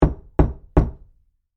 Door Knock Hollow, Foley, Door, Knock, Hollow, Wood, Door, Basement; Door Knocking